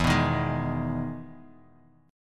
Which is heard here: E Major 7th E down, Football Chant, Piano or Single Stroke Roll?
E Major 7th E down